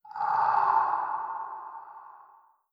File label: idle wet, headcrab reviver